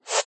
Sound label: jump; nickelodeon basketball stars 2015